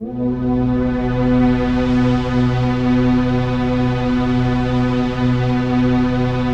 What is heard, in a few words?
PAD, Dance Mega Synth